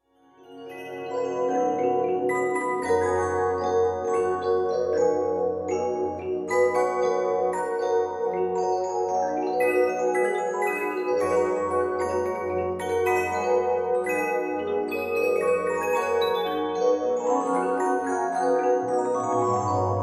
music box

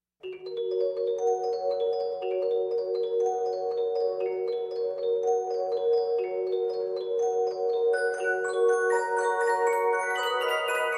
music box

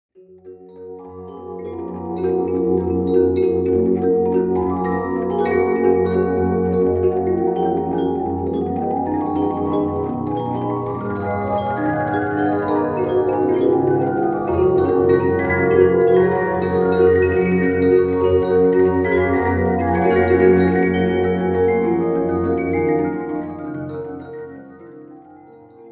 Waltz of the Flowers

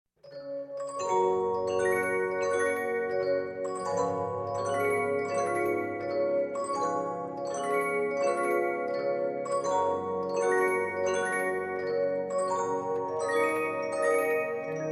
Cloister Bells, music box

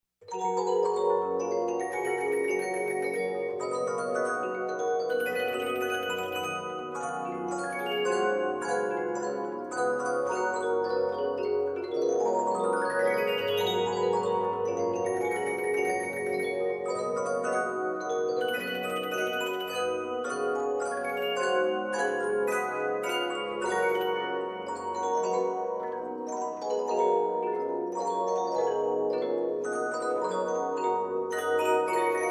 music box

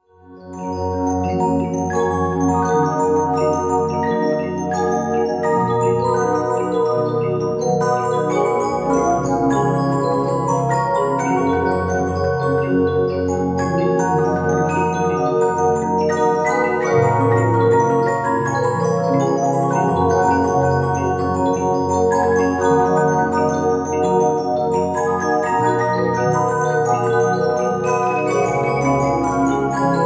music box

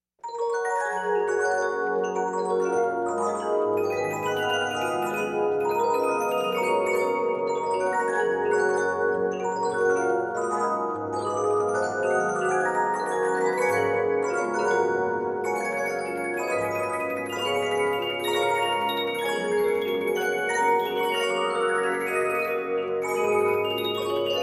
Lenola Waltz

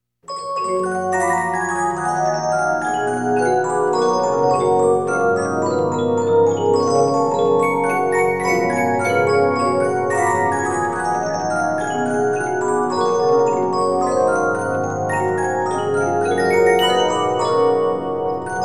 The Convent Bells Were Ringing, music box

